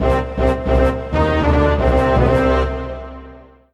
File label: mission lost; Panda